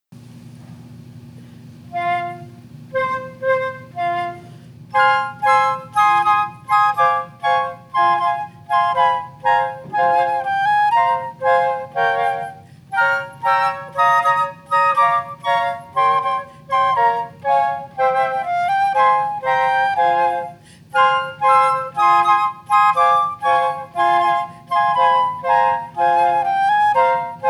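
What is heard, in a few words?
quartet with percussion; habanera; flex-ability classics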